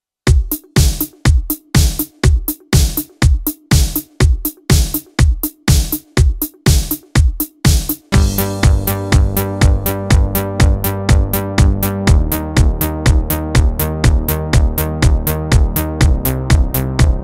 Korg modern beat Korg Pa900; Sergey K

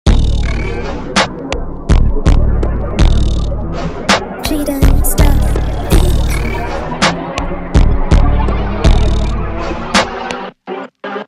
hood trap x dark jerk x jerk drill type beat

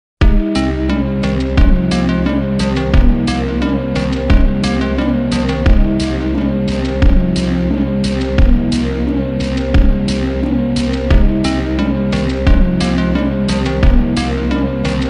slowed reverb

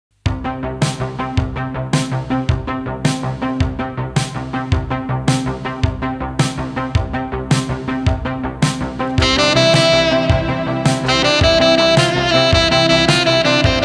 sax and violin

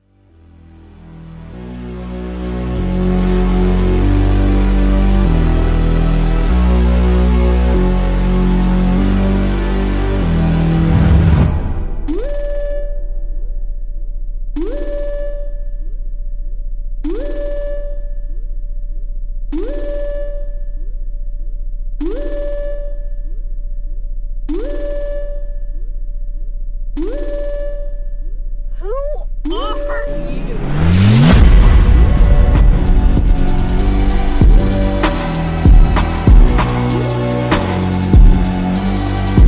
instrumental slowed reverb bass

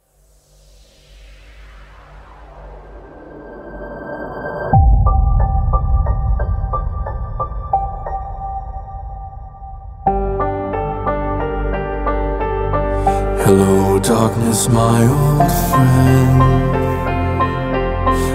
hardstyle

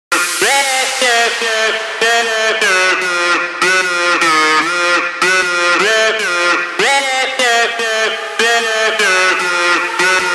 bass boosted

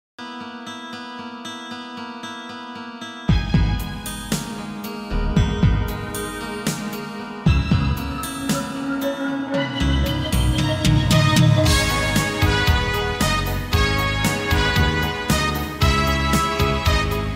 slowed reverb

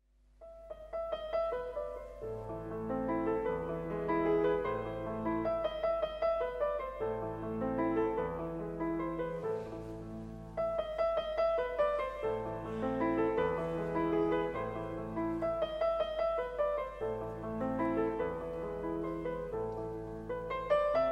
piano version; beethoven; für elise

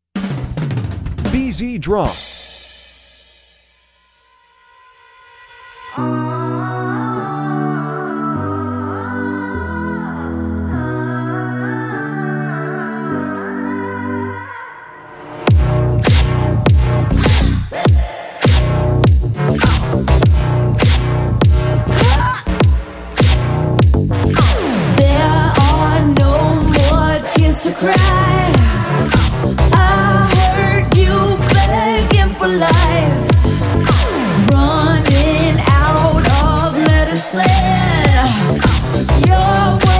drum play along; Drum Score; Drum Sheet Music

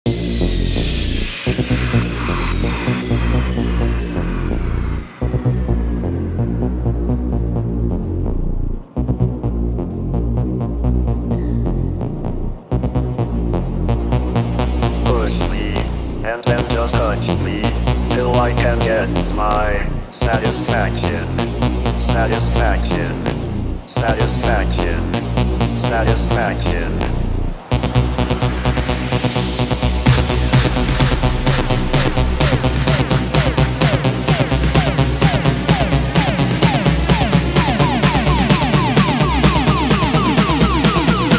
crunk remix

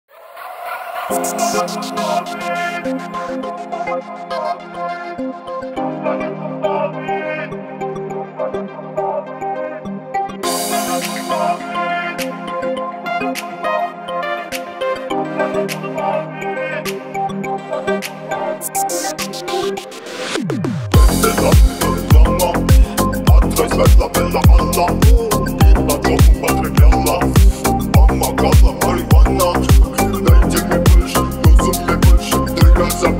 deep house remix

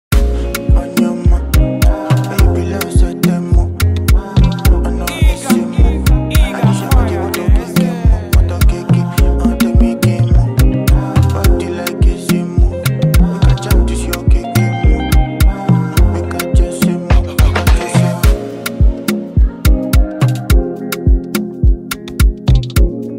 afrobeat type beat x dancehall type beat

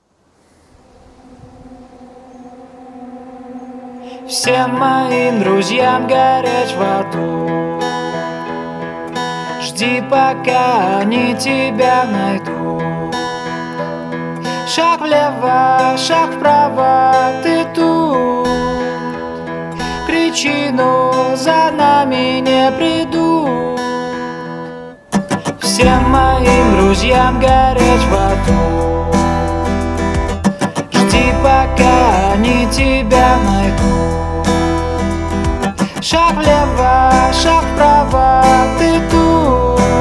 acoustic cover

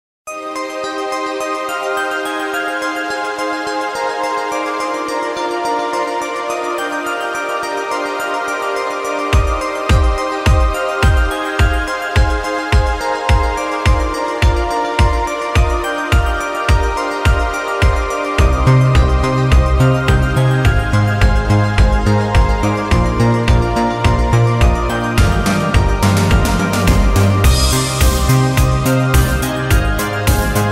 italo disco 80s

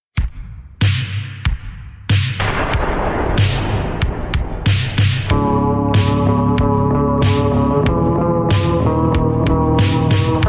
too slowed reverb

